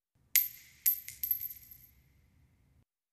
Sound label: Shell Case Sngle Revb, shell casing movement